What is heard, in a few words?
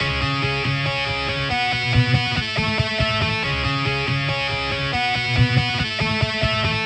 Distorted Guitar 140BPM C#; Distorted Guitars; Vengeance - Essential Clubsounds Vol.4; Guitarloops